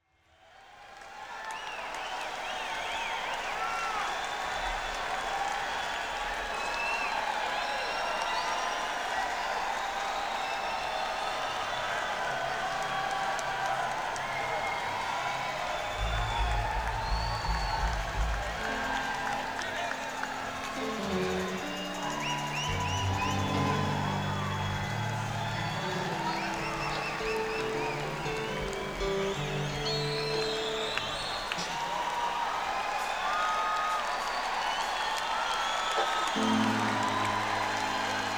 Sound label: Crowd; nak300